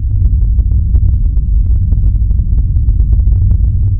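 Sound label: earth shaking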